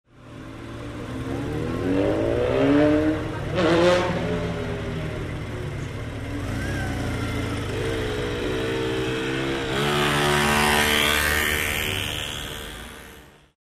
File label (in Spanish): calles av pellegrini y av san martin; Rosario